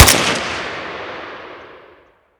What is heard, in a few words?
aek971 shoot